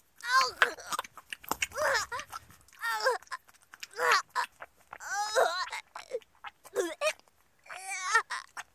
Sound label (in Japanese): "あうぐ！?"